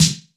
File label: HOUSE; 90 sSampleCDs; Crypton - DrumBase5000